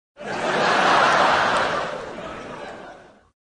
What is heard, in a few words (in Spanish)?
Sonido Risas Multitud, Sound Laughter Crowd